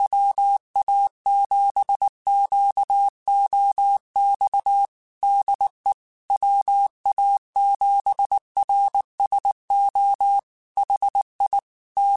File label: Morse Code, QSOs; HamRadio; CW Code Groups; WA7QOX